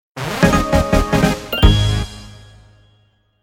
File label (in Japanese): 武器入手ジングル; Apploval